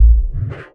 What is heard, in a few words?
Percussive Hit, contact mic